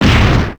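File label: Explosion Abrupt Loud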